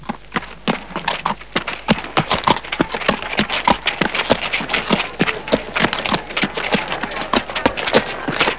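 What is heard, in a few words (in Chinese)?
ma jiaosheng, horse neighing; jiachujiaosheng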